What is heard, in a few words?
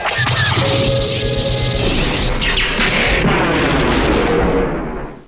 tie kills; starwars trench